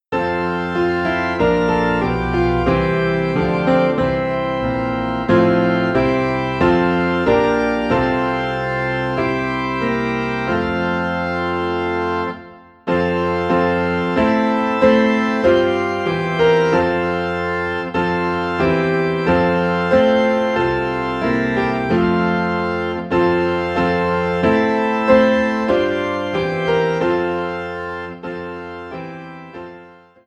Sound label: Piano and Organ in F; Angels We Have Heard On High; christmas